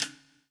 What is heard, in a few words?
POWER RIM